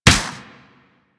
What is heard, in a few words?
Space Ship Turret Fire; Pursuit Space Ship